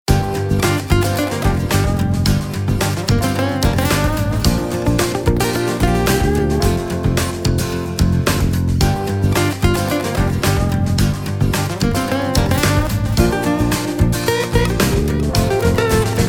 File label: Rock